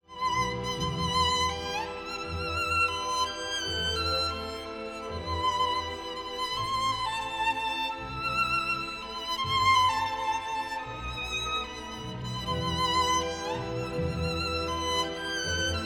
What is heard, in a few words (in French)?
Concerto violon Mendelssohn